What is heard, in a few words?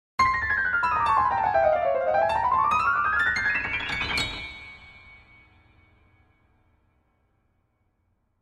Piano cue; Piano cue one